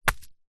small stone drop forest floor; zapsplat; foley

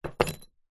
household padlock small set down on wood; zapsplat

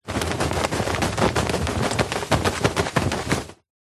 foley clothing movement shorts baggy shake; zapsplat

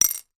food drink beer bottle cap down on table; zapsplat

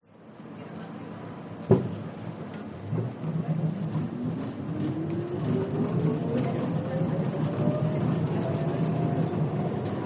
New York City Subway Train Onboard

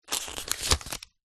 household book very small page turn; zapsplat

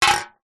can soda empty set down wood floor

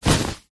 foley clothes sports shorts pick up from carpet; zapsplat

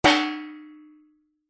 impacts metal tray hanging suspended hit resonate

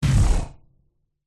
sound design impact designed glitchy thud; zapsplat

